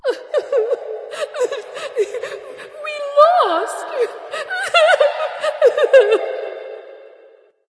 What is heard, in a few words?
boohoo light; smite; voicepack; hel